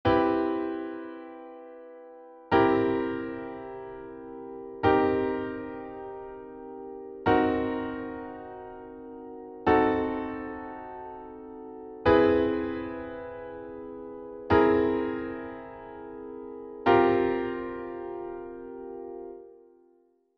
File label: 7th chords in root position